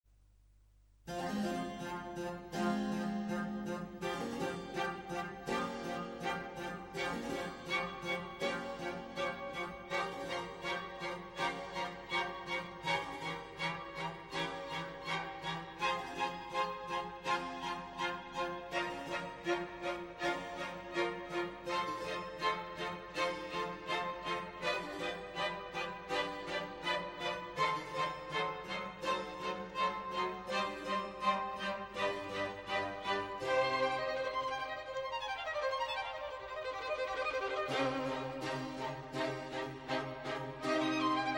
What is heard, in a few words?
musik